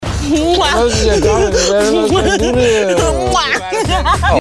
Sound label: giggle